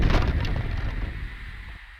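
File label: Music Volcano Light 120; from dust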